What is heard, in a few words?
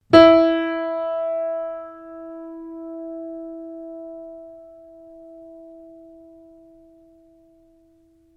PIANO UNTUNED E3 F, Baby grand piano left to fall out of tune; Pianos (Sound Effects:Musical)